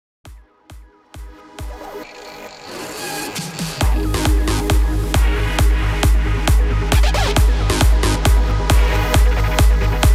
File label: 135 BPM